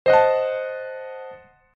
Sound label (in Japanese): 不穏なピアノ危険度レベル